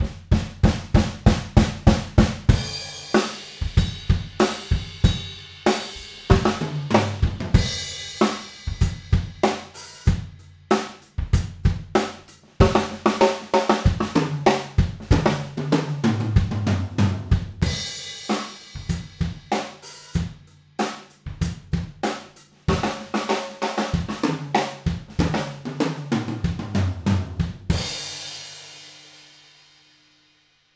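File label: Room Mono Cardioid, drum; drum shootout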